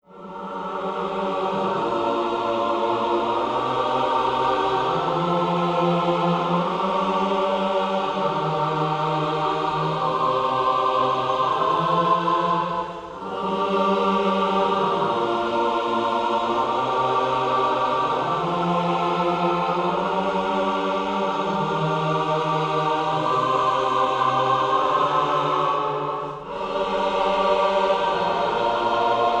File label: Choir; трейлерная музыка